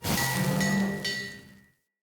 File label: Kintsugi Pickup